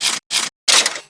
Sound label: shake; dgs2; drebber